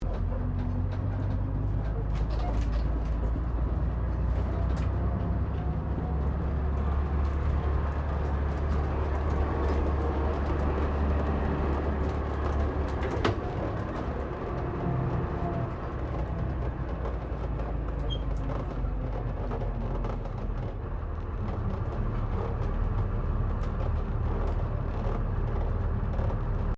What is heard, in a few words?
Bus Double Decker Bristol VR Interior Driving